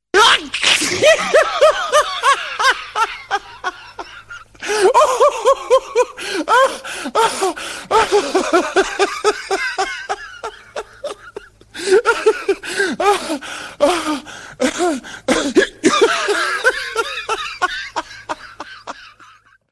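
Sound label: laughter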